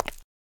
concrete; firefight

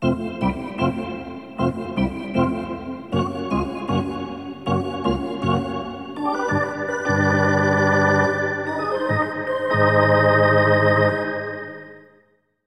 Hockey Organ

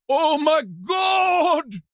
town human far; Blind Drive

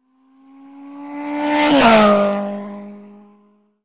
motorbike; Blind Drive

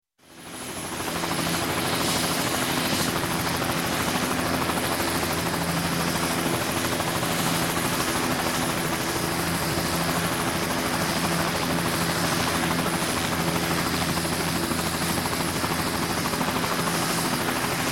Helicopter Hughes 500e Idle- Take Off; Sound Ideas 2000 Series

